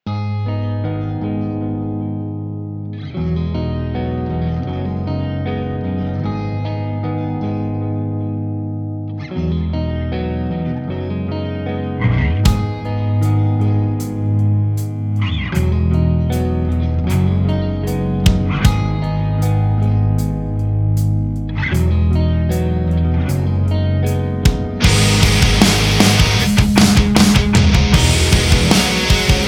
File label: hard rock clean